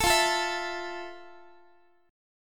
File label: F Major 7th F, Guitar; strummed